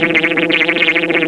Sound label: Razz; Princess and the Pea; SoftKey